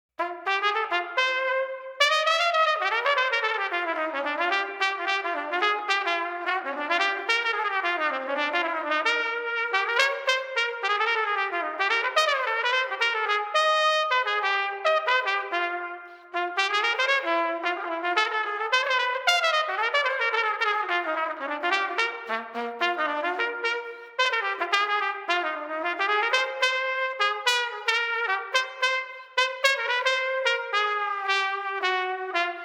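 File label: Street Trumpet Upbeat New Orleans Busy 110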